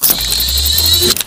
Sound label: Servo Jog; Titanfall 2